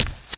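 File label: run left, FOOT; The Elder Scrolls III Morrowind GOTY; XBOX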